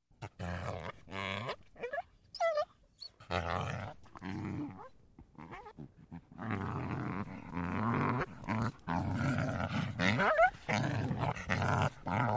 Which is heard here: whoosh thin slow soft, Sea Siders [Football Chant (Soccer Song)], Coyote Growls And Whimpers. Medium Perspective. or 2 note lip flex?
Coyote Growls And Whimpers. Medium Perspective.